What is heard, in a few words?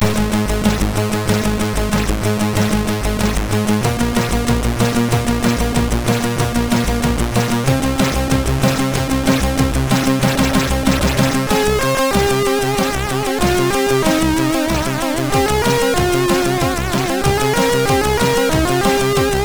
sid MOS6581R3; Ordeal